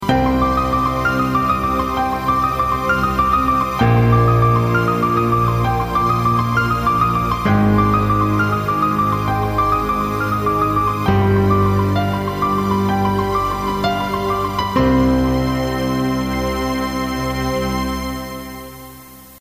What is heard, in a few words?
Keyboards